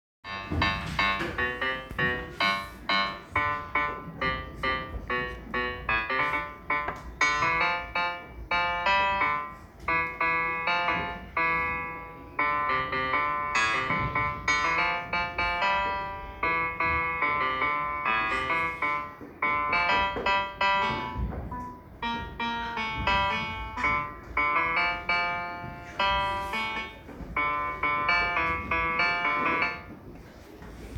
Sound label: piano